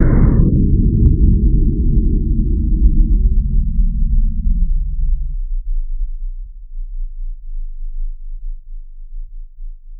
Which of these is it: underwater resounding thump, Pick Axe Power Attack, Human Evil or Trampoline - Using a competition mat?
underwater resounding thump